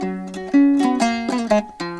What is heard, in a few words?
HALAM MELODY; Best Service; World Colours